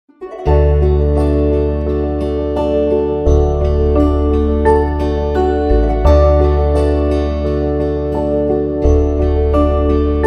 No Perc

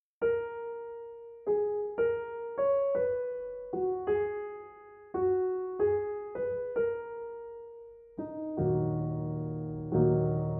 Prelude In Eb Minor Op16 No4

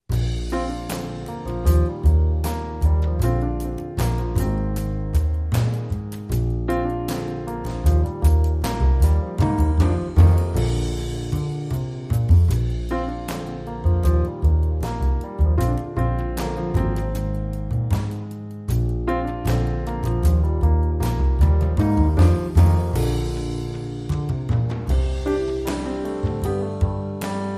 With Trio

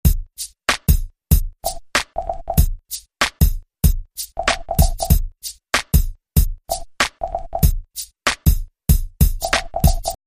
Drum Loop

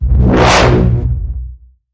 Bend The Bassline, B

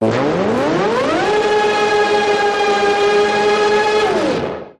Synth Call

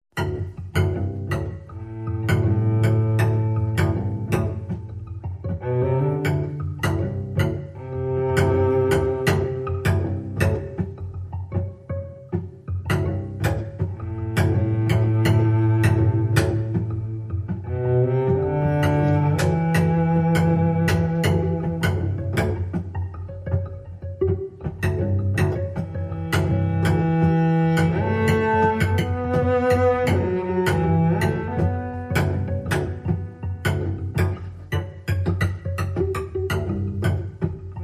Cello Suite 2 Variation 3

